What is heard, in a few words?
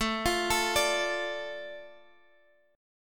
A Suspended 4th Sharp 5th down Guitar Half Step Down